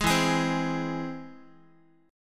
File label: E Augmented, Guitar; E+ strummed; JGuitar